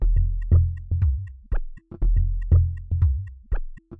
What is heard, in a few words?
120 sinebuddy, DRUM LOOPS and BREAKS